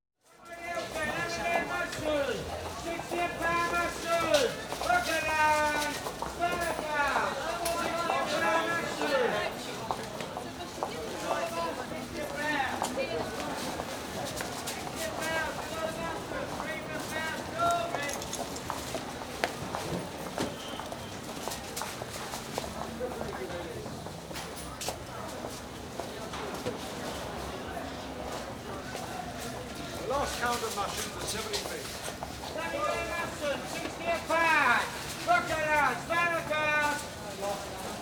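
Street Market London, exterior atmosphere ambience with people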